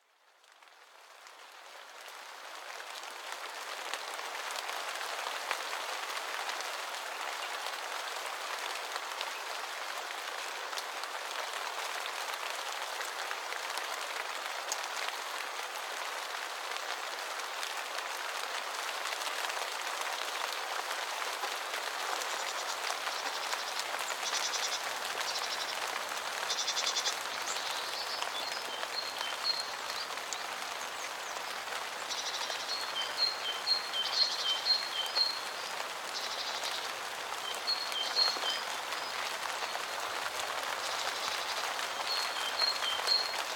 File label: sunshower; Google; Google Sounds; Seasonal Celebrations; alarms